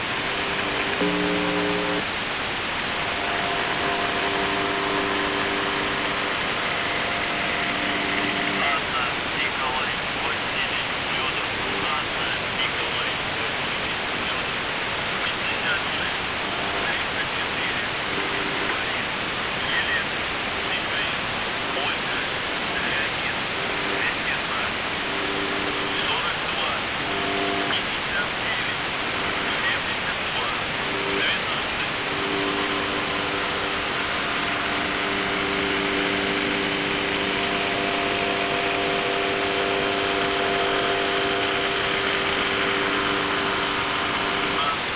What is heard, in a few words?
buzzer usb msg